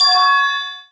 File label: countdown